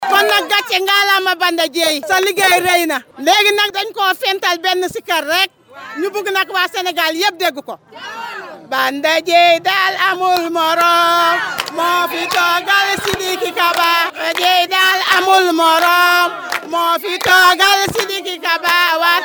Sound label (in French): CHANT FEMME; Lors de la caravane organisée ce samedi en fin de journée par le Bby de Tambacounda, les m